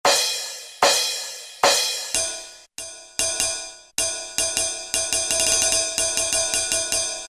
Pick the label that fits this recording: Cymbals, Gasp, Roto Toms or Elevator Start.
Cymbals